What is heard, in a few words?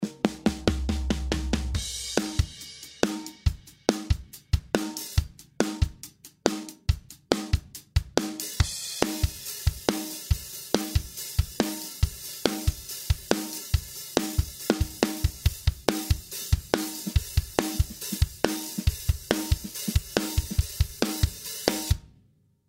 fat channel comp la2a; presonus studio one 3.5